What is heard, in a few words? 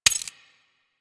Rifle Reload D; Weapons; Warframe; Tenno; Bard Rifle